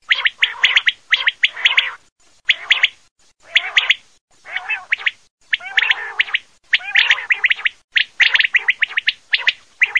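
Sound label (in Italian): QUAGLIA MASCHIO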